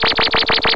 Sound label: in orbit, electronic sounds; Sound Effects for Multimedia - Volume 1